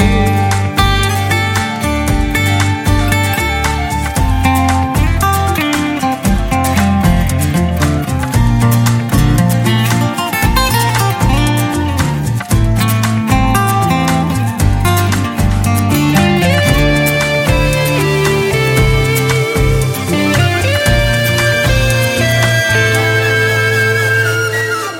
Instrumental Ringtone, Tamil; Tamil Movie Tone

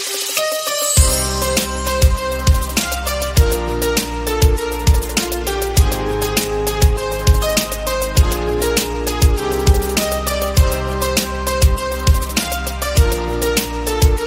instrumental ringtone

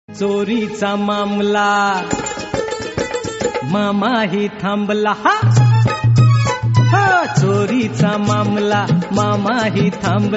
Marathi Ringtone

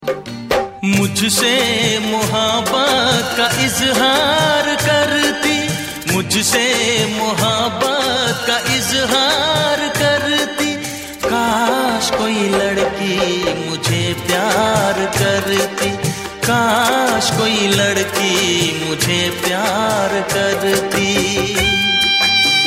valentine day song ringtone

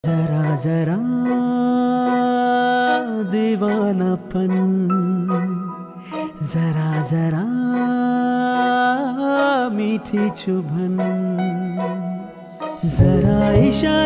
Male Marathi Ringtone

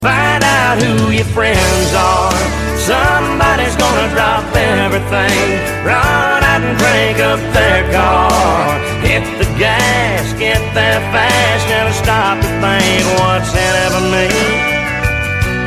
English Ringtone

